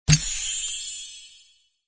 collect lock

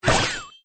wheel multiplier

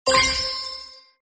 unlock

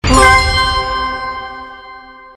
wheel celebration

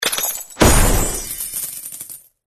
box open

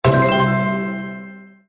wheel show win

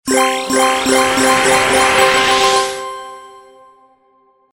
wheel refresh; lucky charm

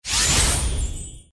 fly partical; lucky charm

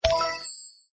coin fly

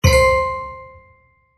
symbol coin

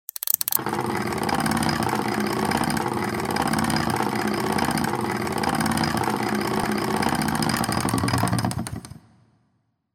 wheel start; lucky charm

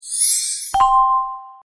map collect; daily prize

